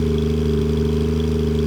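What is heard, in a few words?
meter idle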